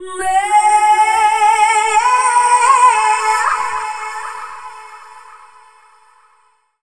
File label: Dance Vocals, FEMALE